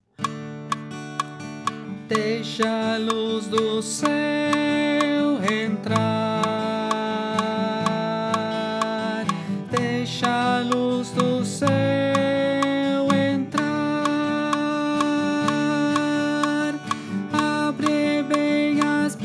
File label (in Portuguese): tenor